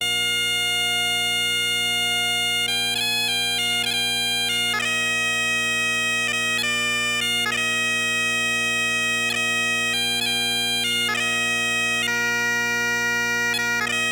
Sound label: Drones